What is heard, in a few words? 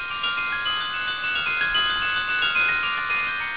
harderwijk, carillons